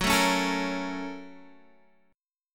Bb Minor Major 7th Sharp 5th

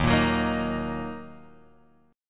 D sharp Minor D sharp down Guitar Drop D

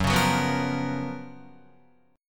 Gb Major 11th Guitar; GbM11 strummed; JGuitar

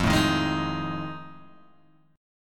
F 7th Sharp 9th, Guitar, Open G

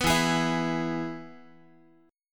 E-Major E, Guitar; strummed; 14,12,11,14,x,x

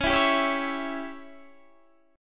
C sharp Diminished C sharp down Guitar Standard; C#dim strummed; JGuitar

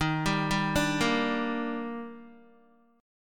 D sharp Major 7th, Guitar; D#M7 arpeggiated

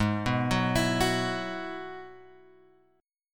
G sharp Minor 7th Sharp 5th G sharp; G#m7#5 Chord {4 2 4 X 5 2} arpeggiated

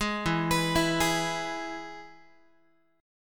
E-Major E, Guitar; arpeggiated; JGuitar

